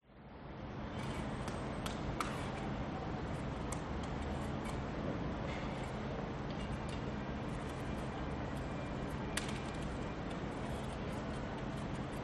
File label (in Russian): zvuki utra